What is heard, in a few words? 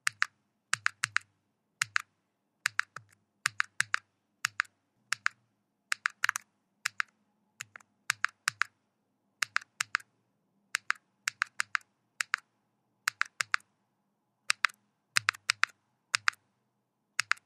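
calculator buttons sound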